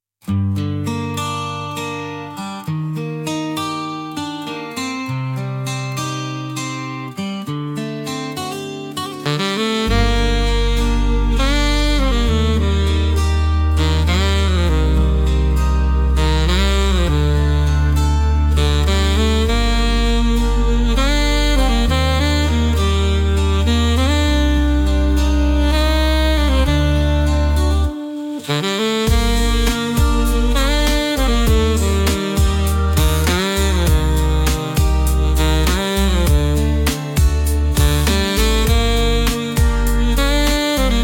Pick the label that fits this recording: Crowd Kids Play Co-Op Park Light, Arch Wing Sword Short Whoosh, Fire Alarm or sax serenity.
sax serenity